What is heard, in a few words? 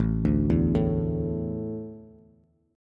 A Suspended 4th, Bass; Asus4 arpeggiated; JGuitar